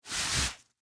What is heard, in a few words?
Rope Pull Heavy; Hand Tools